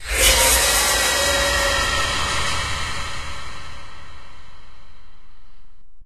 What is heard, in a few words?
Ambient Stinger; SFX Ambient; Josh Eyes